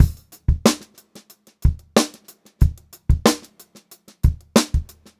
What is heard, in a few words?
92 ST BEAT, Funk-Groove Drumloops; USB Soundscan vol.38; AKAI